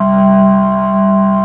C2, Keyboards Old School; USB Soundscan vol.09; AKAI; SMALL B3

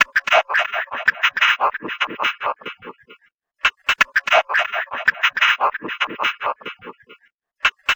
Fossil Fuel, Machinery; Sonic Foundry (Sony Creative Software); Myths of Technology

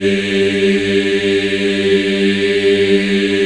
G2, Choir; USB Soundscan vol.28; Acoustic & Synth; AKAI

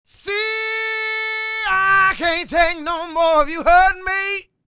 SING, Vocal Planet Blues Soul BLUESY

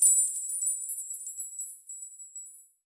mixed bells, bowls; PS-52 WAV WORLD INSTR 2; WORLD PERCUSSION

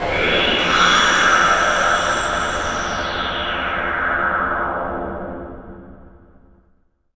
NOISE, Production Effects

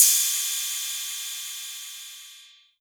808 Cymbal

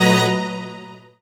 string stab

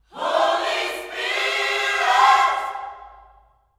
H SPIRIT, Gospel

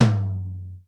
XE8 TOM; Crypton - DrumBase5000

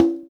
Conga; Equipped Music; Loungin House

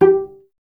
Cello G; Miroslav Vitous; Solo Instruments

